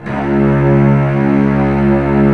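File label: cello C#1; Digital Sound Factory Virtuoso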